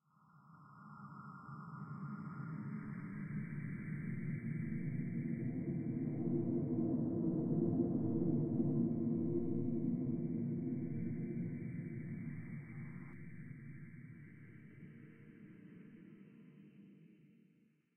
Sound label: Ghostly Synth; Lethal Company